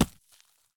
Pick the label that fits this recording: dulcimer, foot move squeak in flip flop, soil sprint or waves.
soil sprint